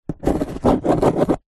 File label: office pen ball point write on empty cardboard box